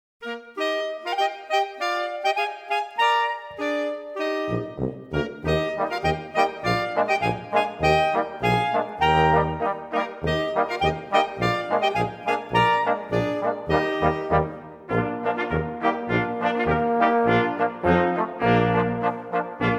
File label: Dreher